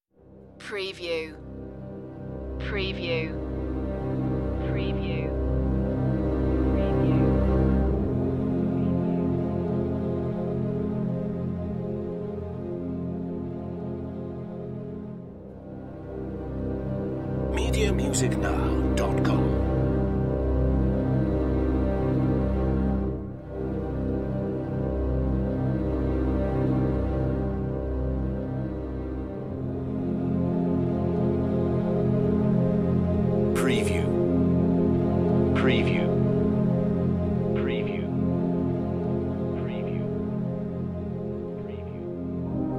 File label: strings only